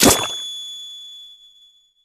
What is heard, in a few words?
Memorycake Icing; the princess and the frog; Diner; Icingsquirt